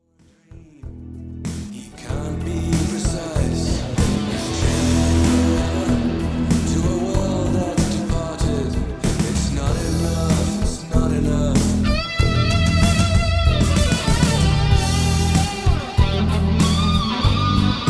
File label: first solo; HM2-GE7